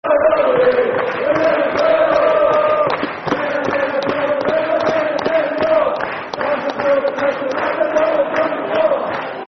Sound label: Oh Campione, Football Chants (Soccer Songs); Liverpool Lfc